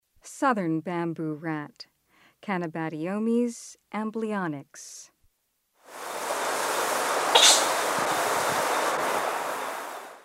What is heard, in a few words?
Southern Bamboo Rat; S. America